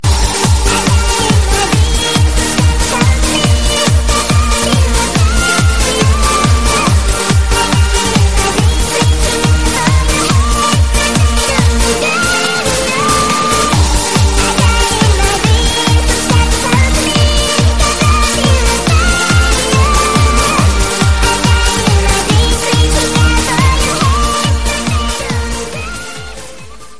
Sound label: funeral song